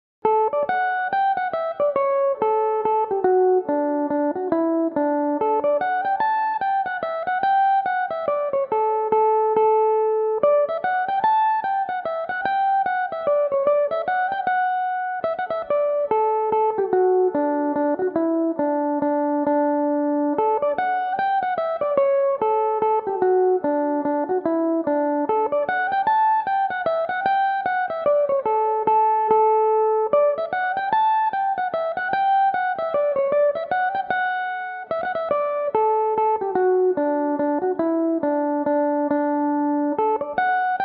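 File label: d major, mandolin; Napper Tandy; (3/4), D Major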